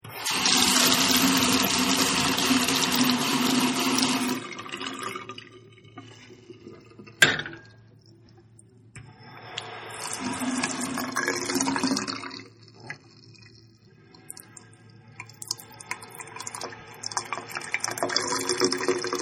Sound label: Bathroom Faucet Runs For About 25 Seconds, Water Runs Down Drain